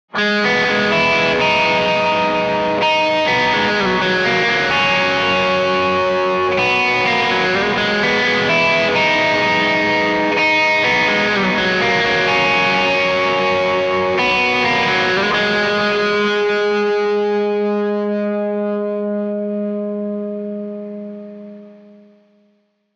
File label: c gtr